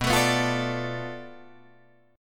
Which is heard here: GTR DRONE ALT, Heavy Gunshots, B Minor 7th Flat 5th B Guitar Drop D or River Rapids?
B Minor 7th Flat 5th B Guitar Drop D